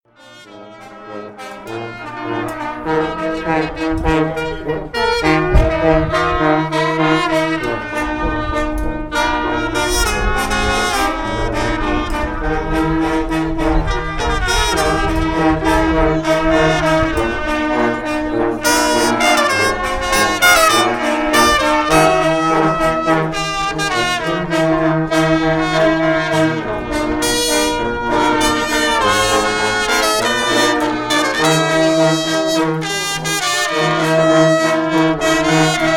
Nine piece horn band, Random sounds from in and around Kasese Uganda